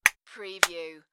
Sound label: Clap Clap; beanstalk audio